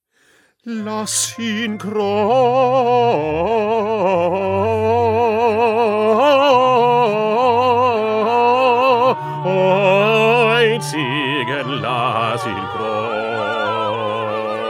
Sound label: Bass; Bach; St Matthew Passion